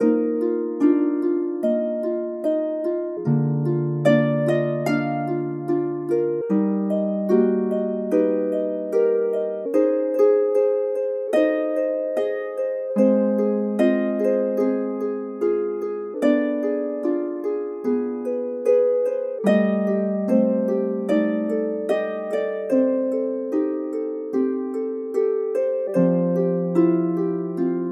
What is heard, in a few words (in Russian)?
Zvuki Arfy